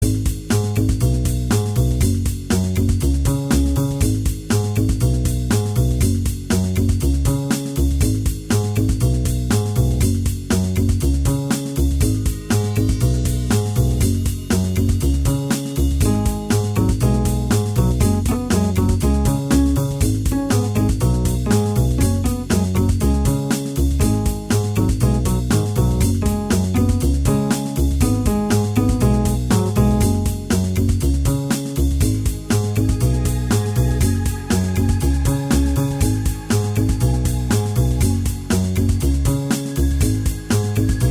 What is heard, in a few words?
Music Gameplay Music Gameplay; Into Space